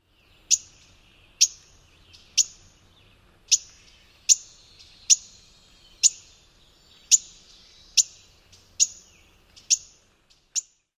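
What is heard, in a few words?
Mourning Warbler, male alarm calls; NY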